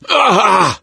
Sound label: hurt major, gambler, survivor voice; source engine; left 4 dead 2